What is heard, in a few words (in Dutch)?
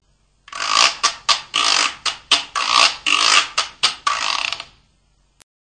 Hout guiro